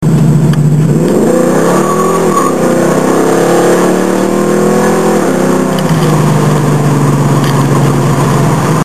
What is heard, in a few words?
muff; cuda